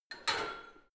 barbell heavy plates lift rack, fitnessstudio

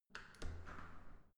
entrance door open

